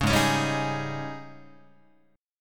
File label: Ab Major 7th Suspended 4th Ab down Guitar 4A 4E 5A 5D 5G sharp 6C sharp; strummed; JGuitar